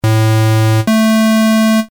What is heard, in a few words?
squ